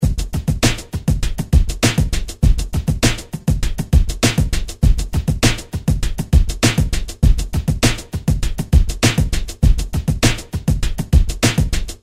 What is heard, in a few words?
rhythm industrial; Sound Graph